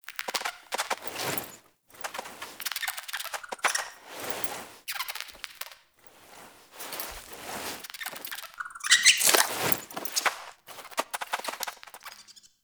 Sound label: Rat Rescue; Verminia Quest